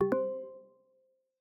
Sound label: success, iOS Sounds